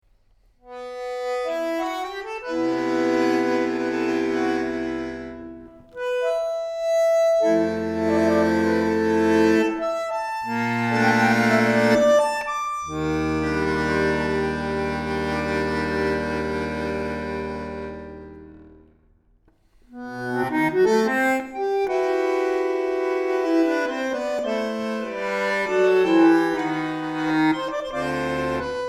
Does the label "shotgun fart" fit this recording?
No